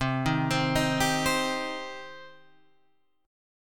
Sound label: C Minor C down Guitar Open G